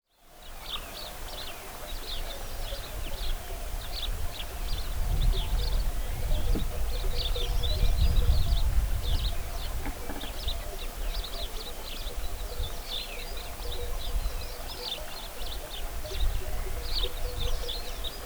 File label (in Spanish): AMBIENTE PUENTE; PUENTE DE LA ANTIGUA